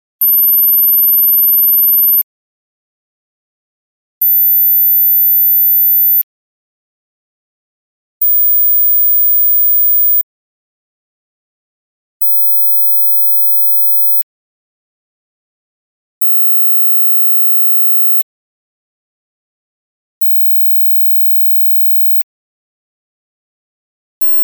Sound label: tones right